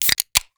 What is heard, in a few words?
Soda Can Open